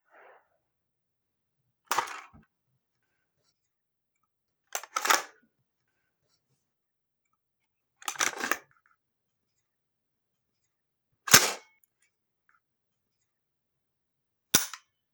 ar15 dropmag loadmag pullhandle dropbolt dryfire, Gun Sounds